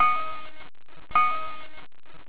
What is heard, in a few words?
buoy and bell